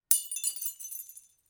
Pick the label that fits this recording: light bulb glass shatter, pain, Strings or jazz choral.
light bulb glass shatter